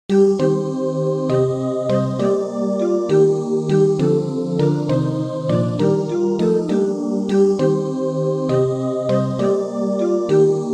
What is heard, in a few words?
away haul away ho tutti